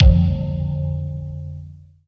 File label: KICK BELL, Dance Kicks; Roland L-CD701; Dance K1